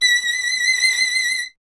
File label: STR VLN, Vln Detache; Miroslav Vitous